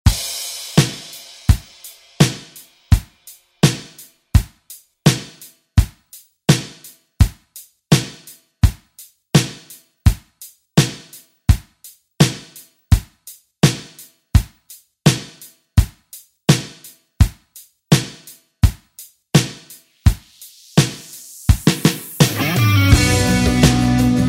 90s ReDrum; DJ Mhark

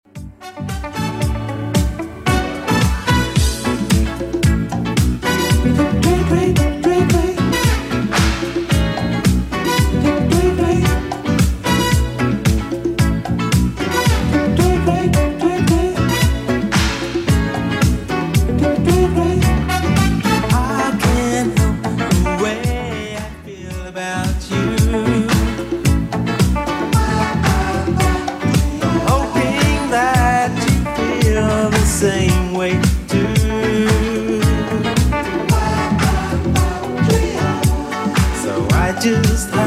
80s Redrum 112 bpm CLEAN

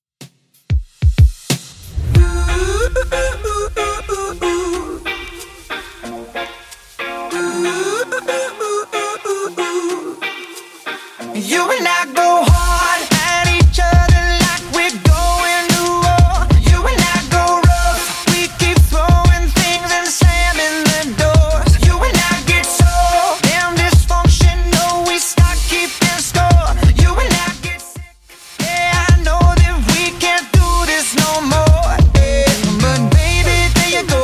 Top40 Redrum 93bpm

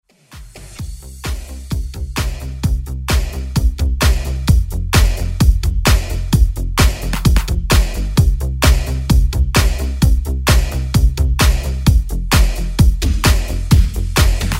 80s Redrum

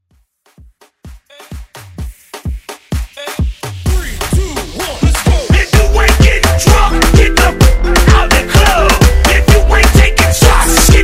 Afro Redrum Hype

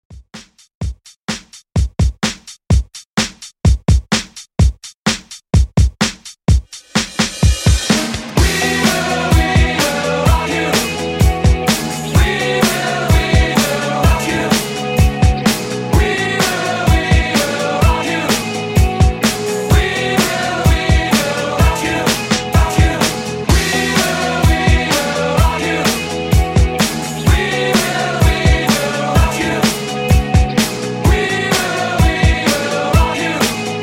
80s Rock, 127Bpm

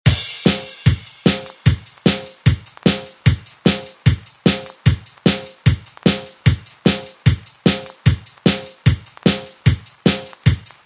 60s Re Drum; DJ Mhark; remixplanet

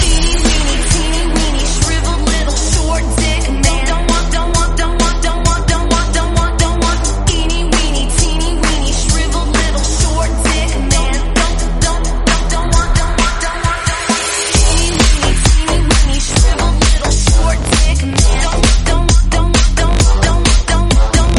132bpm Em

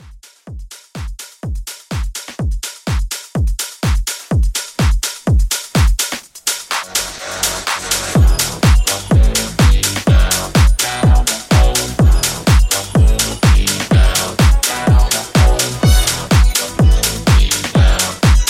80s House Re Drum

